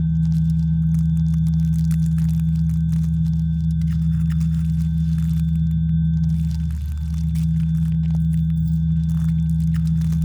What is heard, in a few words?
pro ship science idle; biogenesis